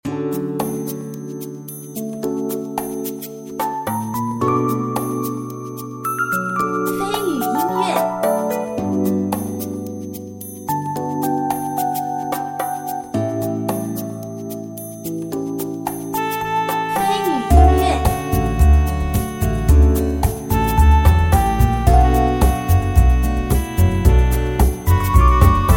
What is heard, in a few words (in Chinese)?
轻松-灵动-休闲